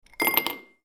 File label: Ice Cubes in Glass